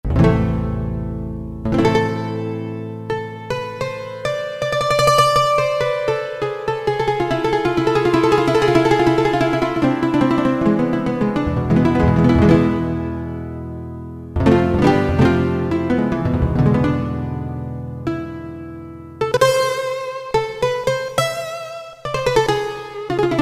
Spanish Guitar; Roland PN-D50-04

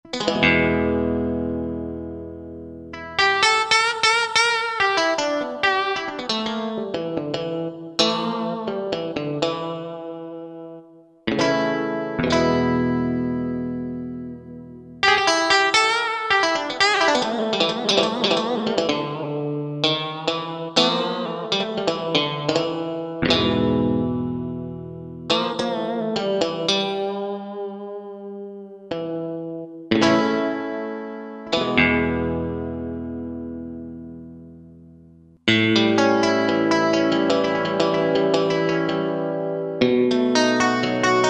Dyna Strat; Country; Roland SR-JV80-17 Country Collection

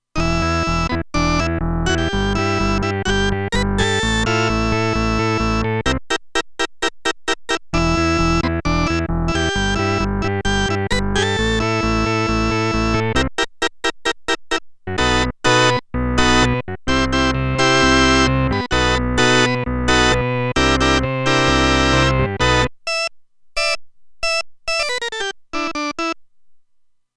Cheesy Organ, Roland Alpha Juno-2; Original Sound Chart patches

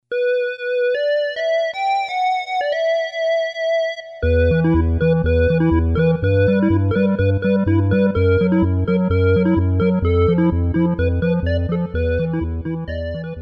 Synth 1, Casio CSM-1; Tones

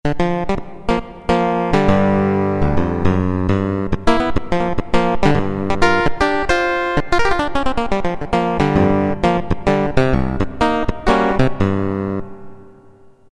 Clavi, Roland MT-32

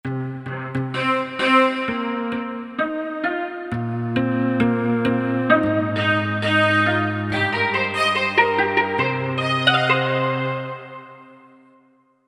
Velo Switch; Korg MSC-1S Variety

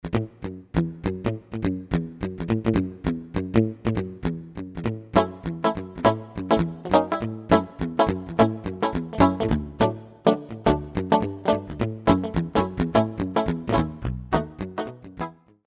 E. Guitar, Roland U-220; Factory Patches

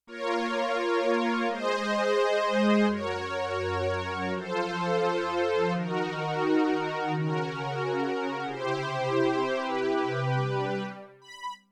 Strings, Yamaha TX16W; Combo Performance 2

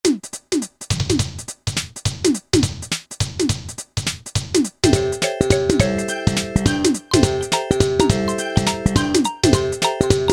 NEW JACK SWING, Yamaha PSR-300; Styles

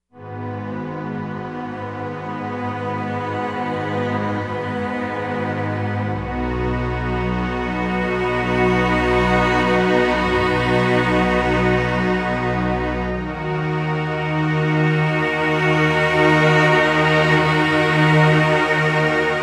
Clean Strings

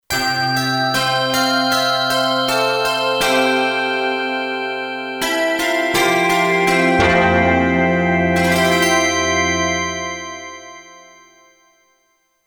Gongers, Kurzweil K2500X; Factory Programs

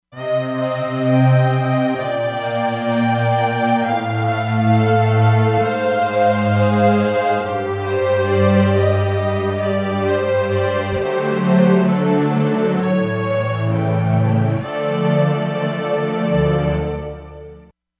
Quiver Pad, Korg MSC-07S Synth; Korg MSC-07S Synth 2

